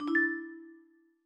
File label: focus Mode; NVDA; Cubic